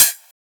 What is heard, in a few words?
airy pedal hi hat f sharp; hat samples